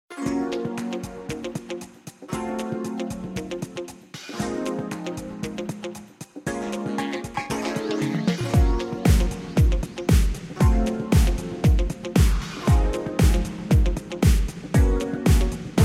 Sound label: city vibe loop